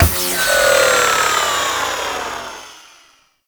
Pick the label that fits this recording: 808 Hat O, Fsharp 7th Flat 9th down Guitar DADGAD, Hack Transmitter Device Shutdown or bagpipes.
Hack Transmitter Device Shutdown